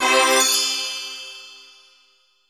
pickup life; diver jimmy

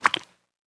dig clay child; Immortal

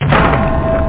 crash; Rebound Arena

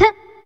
hero jump; diver jimmy

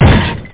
miss bat; Ozz-Feast

